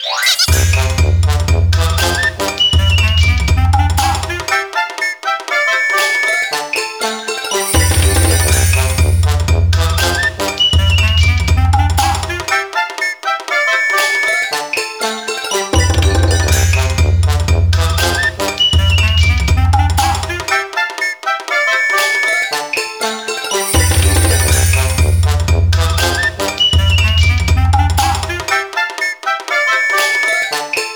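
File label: course select Bgm; Taiko no Tatsujin Doka to Oomori Nanadaime; PS2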